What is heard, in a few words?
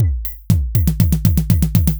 beat Fill; Anabeat; Sonic Foundry (Sony Creative Software); Prototechno